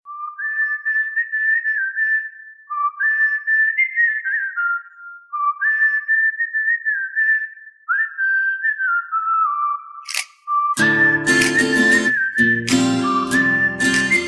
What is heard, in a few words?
Whistle